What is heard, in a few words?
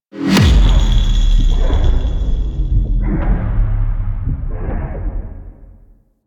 Vault Heart Broken Zap Attempt; Ambience; Warframe; Infested Eris